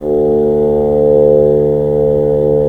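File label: wind bassoon; LA Composer vol. 3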